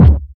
nice kick one shot d key, kick one shot tuned to the D note